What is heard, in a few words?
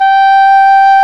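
English horn; Sound & Vision - Gigapack I CD 2 (Roland); Brass&Woods1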